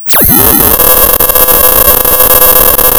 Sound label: Sound of tearing paper, center